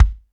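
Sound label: JAZZ kick; JAZZ KITS; Crypton; DrumBase5000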